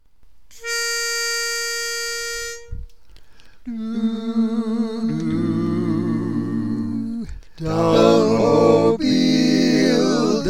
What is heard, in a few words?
Tenor, barbershop